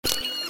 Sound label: ahhhh